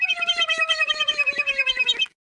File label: vox voiceless laughing